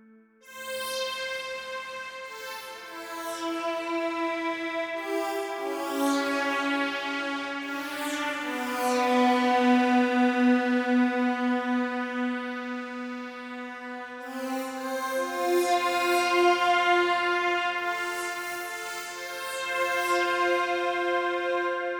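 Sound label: Headrush Synth Space Notes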